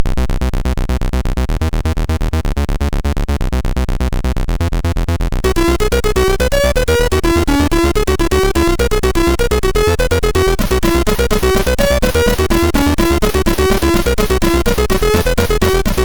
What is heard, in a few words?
Loader Tune, MOS6581R2